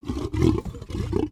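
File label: Sabertooth speak; creatures; pets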